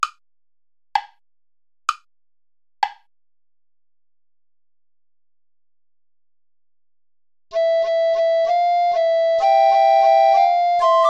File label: tango; albeniz isaac